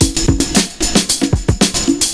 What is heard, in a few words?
PERCS, 70 s Breakbeats